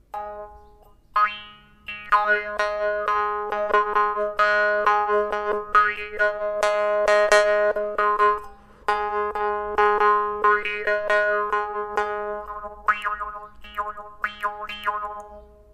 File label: mor4ong tamil